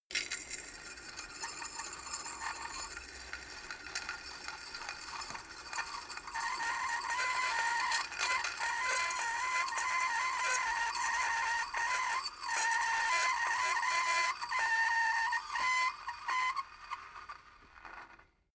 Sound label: bicycle spin wheel scratch metal; fahrrad; verkehr transport